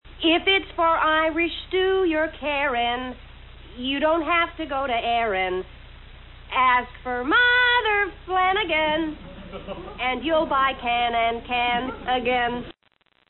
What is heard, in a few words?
Jingle; Mother Flanagan